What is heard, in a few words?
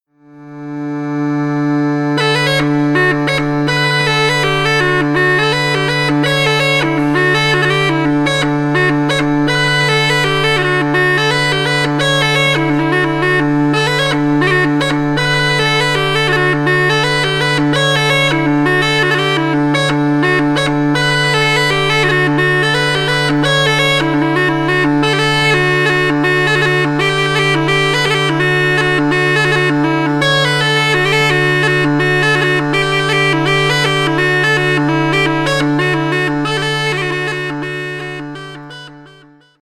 hornpipe; william southern clarke's hornpipe